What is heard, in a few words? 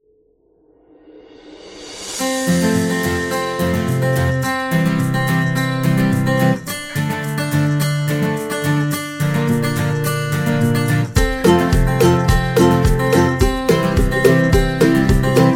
Summer Guitar, happy music